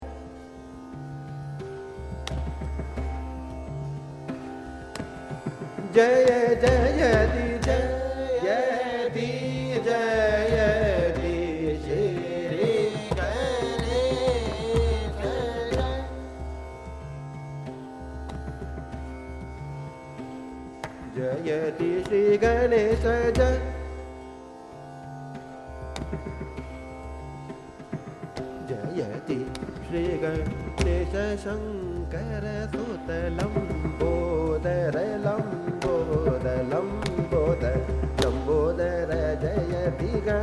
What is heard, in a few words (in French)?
Chant Dhrupad, Raga Malkauns (Dhrupad En Chauta, male vocal; Chautal Jayati